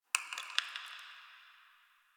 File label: Ice Crunch; Warframe; Ambience; Nineteen Ninety Nine; DE